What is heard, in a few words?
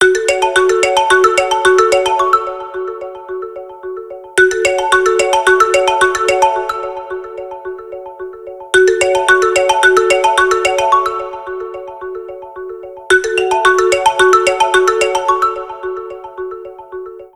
Old bell, ringtones; SK telesys; SK-S100